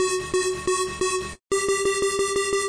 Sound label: bell synth; remixable collections